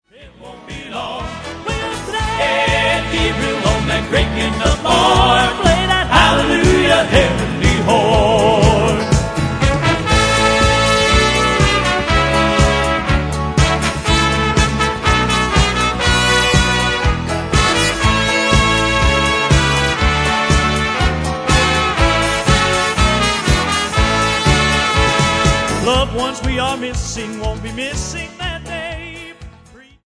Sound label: Hallelujah Heavenly Horn